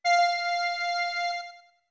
Notes Music Box F long; OOT

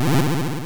Dragon Fly Longer; OOS; Onox; Zelda; Oracle

